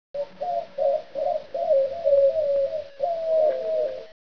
distant owl

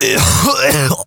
cough; player

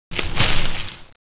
brush movement; ambience

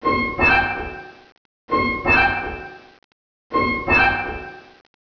pipe; rebar

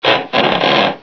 creak loud; ambience

